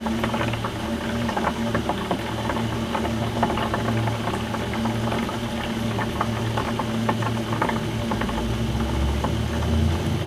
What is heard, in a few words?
bucket fill, fieldrec